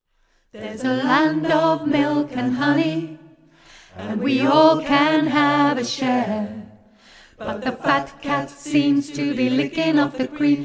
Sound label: Alt, choir